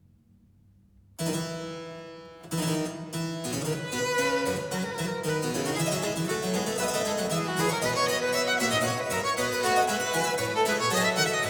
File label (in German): sonata für Violine und Cembalo F-Dur presto; johann sebastian bach; bwv 1022